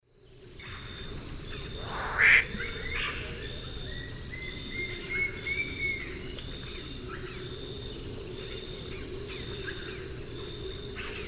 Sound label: Querula purpurata, calls; S. America; Eastern Lowlands